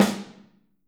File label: Snare OH; Snare OH All; Drums Percussion